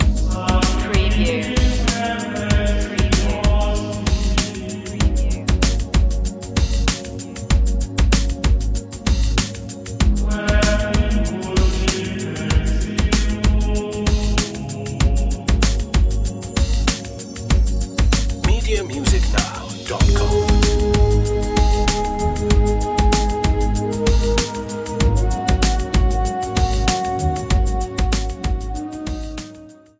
gregorian groove

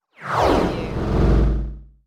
Scifi Production Element - Landing Dock

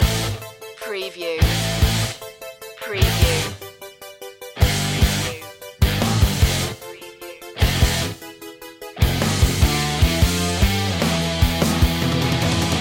japanese cartoon theme loop

